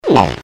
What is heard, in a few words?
whine